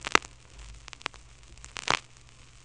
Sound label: Cool Statik; Mo' Phatt; Digital Sound Factory Mo' Phatt